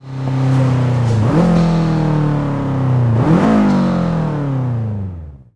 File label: throttle off, vehicles; gmod; tdmcars; sub wrx sti